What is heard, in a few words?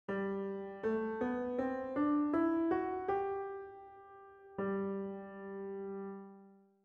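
G major scale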